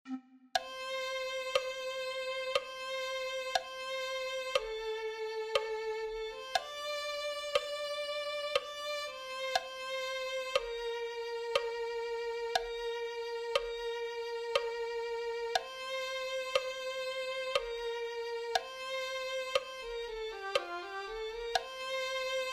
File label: violin 1